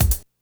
kick hat, hardcore breakbeat; Big Fish Audio; Hardcore Breakbeat Action

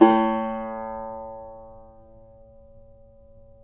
yayli tambur; Spectrasonics; Hans Zimmer Guitars VOL-2

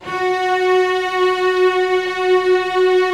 STR SEC, STRINGS; Roland - LA Composer vol. 1

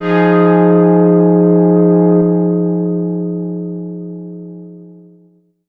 Strictly Synthe, Excessive Stereo; E-MU Producer Series Vol. 6 – Analogia Project

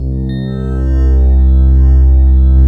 NEIL PAD C2, Ethereal Atmosphere; USB Soundscan vol.13; AKAI

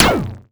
Cwejman S1 - Zap

